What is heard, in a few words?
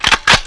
pickup weapon